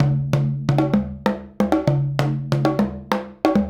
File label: Conga With Sticks; Drums; Essential Percussion